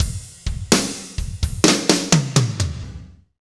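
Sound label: slow shuffle, Roland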